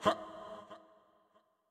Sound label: Toxic Mask Small Effort